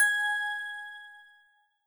bell

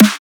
normal hit clap

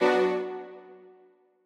Strings

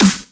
soft hitclap; osu

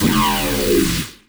Growl

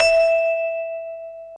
N Bell E5

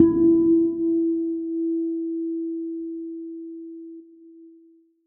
Bass E

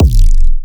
up kick

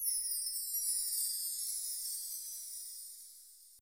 wind chime

